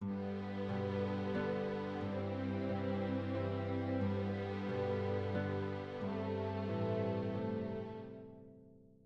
前奏は、バイオリンⅠ、バイオリンⅡ、ビオラ、チェロのロングトーン、それからハープの律動からなります。; オーケストレーション：前奏

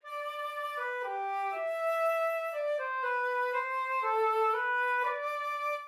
on legato, レガートのフルートの旋律; midiデータの切れ目を重ね合わせると、 / レガート奏法となります。

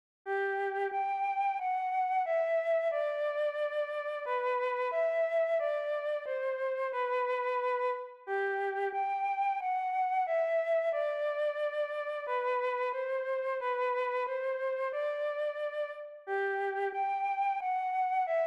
旋律の続き

